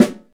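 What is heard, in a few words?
smooth snare drum b key, acoustic snare tuned to the B note; snare drum samples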